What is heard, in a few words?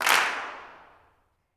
CLAPS, Gospel; CLAPS+SNAP; ILIO; Vocal Planet